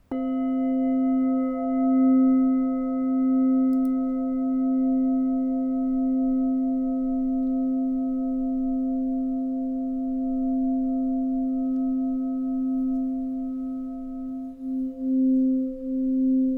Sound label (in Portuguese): TACA C4, instrumentos de quartzo; escutar instrumentos de quartzo